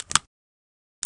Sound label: Handgun Idle